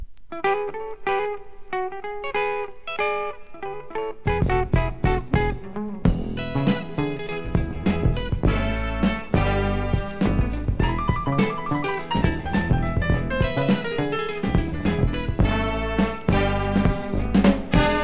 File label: jazz rock; ビッグバンド